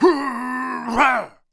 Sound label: attack, monster; gupae